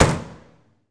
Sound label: 65 Corvette Hood Close, Automobiles